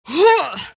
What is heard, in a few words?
grunt; Legion Commander